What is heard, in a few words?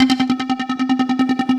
LOOP FX, Drum & Bass, 150 BPM; Ueberschall; Drum & Bass Resonance